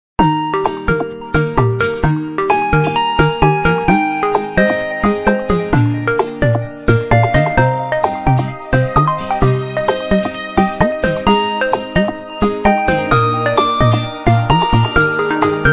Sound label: Cheerful Time, ringtones; Gionee; S10